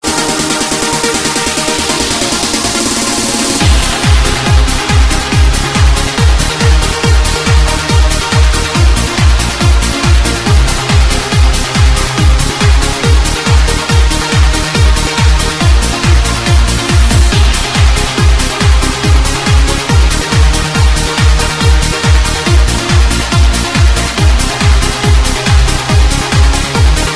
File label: Trance Music